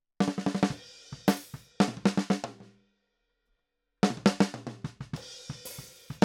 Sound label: Snare